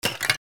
machine burst

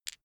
dice clatter short

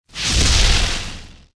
fire impact short

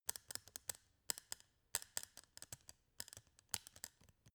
scissors handle

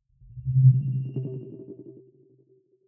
sci-fi display transmission modulated

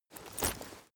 leather metal walk normal single

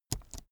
keyboard laptop space press slow soft

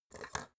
folder clamp flip

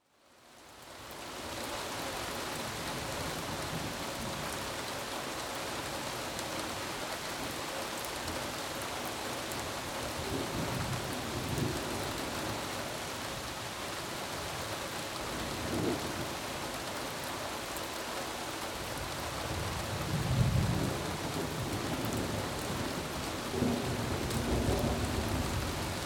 thunder and rain

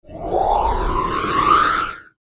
airy smooth phase

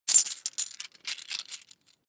coins big clatter fast

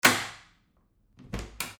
door snap

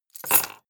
key ring large place table hard

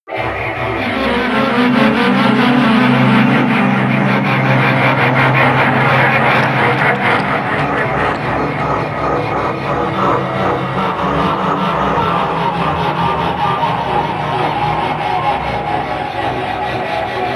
atmosphere alien scrape

